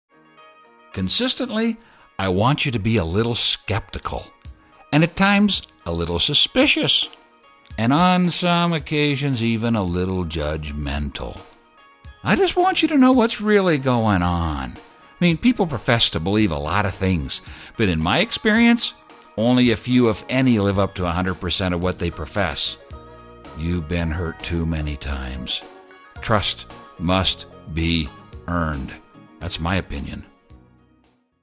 music

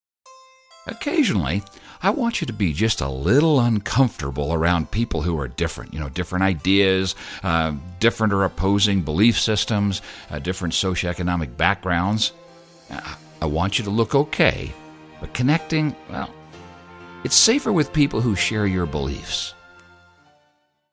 music

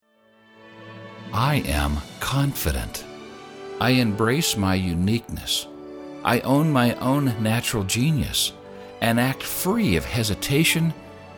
Confident music

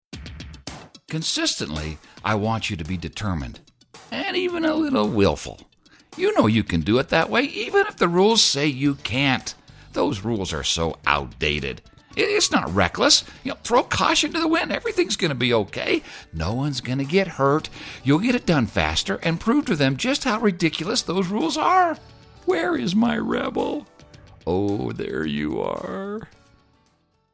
music

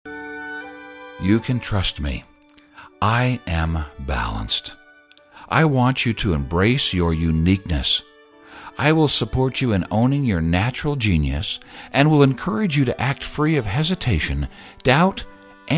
Confident balanced music

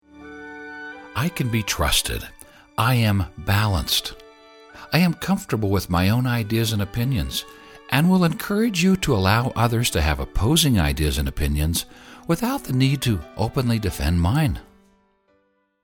Peaceful balanced music

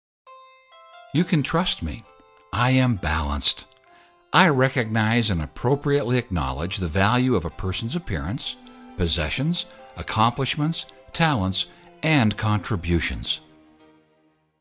music; Respectful balanced

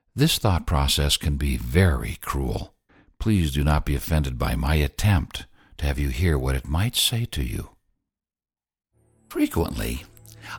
Confident 3 4 over music

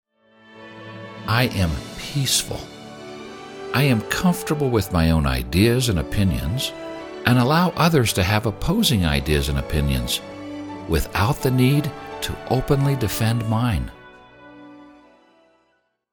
Peaceful music

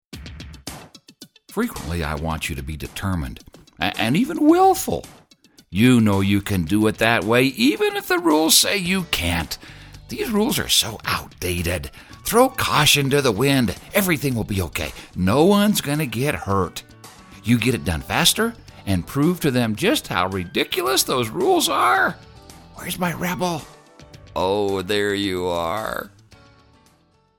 music

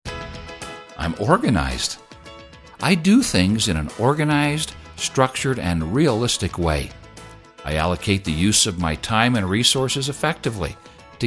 music

